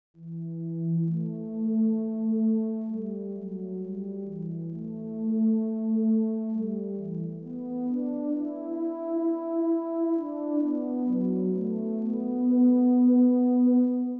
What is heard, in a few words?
Melody; mozartmusica